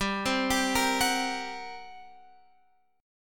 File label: G Minor Major 7th Double Flat 5th G, Guitar Open F; arpeggiated